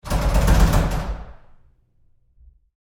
Door Bathroom Stall Handling Roomy Rough Short; Big Room Sound

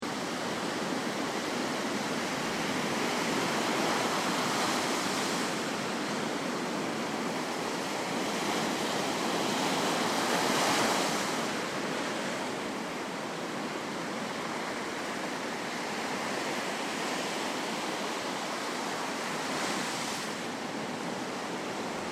Ocean Beach Sound Of The Sea Stormy Sea; Surging Billows; Crafted Signals

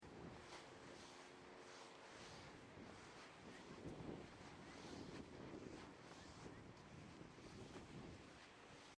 Beach Walking At The Beach; Ocean; Nature; Crafted Signals

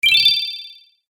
Light Ward Elf Magic Spell; Magic Sound Effects

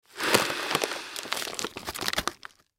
Paper Bag Blow Air Into; Materials; Foley; Blastwave

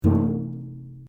RUBBER ON METAL HOLLOW; Materials

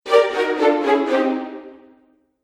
Fail Strings; Musical; Musical Sound Effects

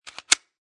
Pistol Semi Auto Rack; Gun Handling

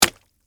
Impact Splat Orange, Texture, Fruit; Splats; Objects

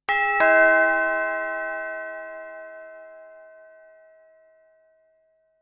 Chiming ding dong door bell; Chimes; Multimedia

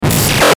DIGITAL CHAOS, Digital Chaos Glitch Warp Fast; glitches; Sound Design; Blastwave

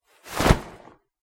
comedy flasher coat, Comedy Flasher Coat Open; Comedy / Cartoon Flasher Opening Coat; Cartoon Sound Design; McKinney Sound

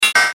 Error Tone, Error Tone Multimedia; Web Interactive; Technology; Noise; Electronics; Blastwave

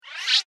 Zippy Stinger Whoosh; Whoosh Sound Effects

